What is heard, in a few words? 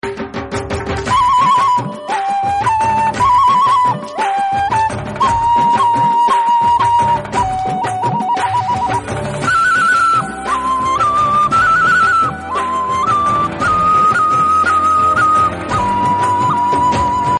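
flute, ringtone; judwa; basuri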